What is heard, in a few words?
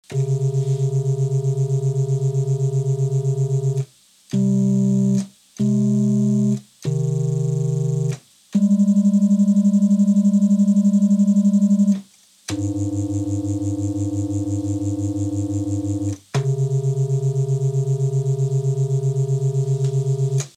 alien noise filtered